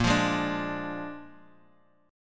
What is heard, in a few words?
A sharp 7th A sharp